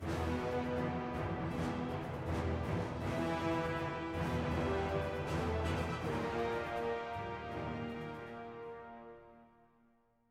Stinger War Start

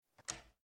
Cabinet Door Close; granny chapter two; android

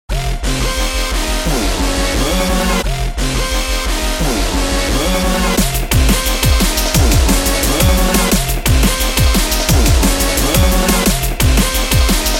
Techno; Overdrive Project Alpha Volume; Panic Tree 3

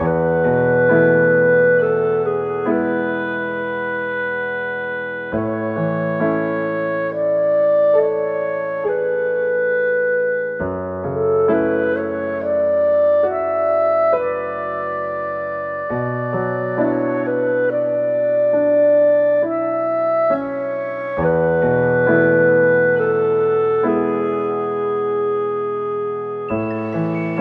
Slow Paced Slice Of Life Loop; Eternal Radiance

